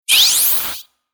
Future Telemetry Static Riser Short Digital Computer Button Space; Tom Hutchings